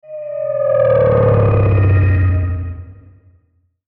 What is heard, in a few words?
Logo Pitch Descend Sweep; Sonic Logo; Blastwave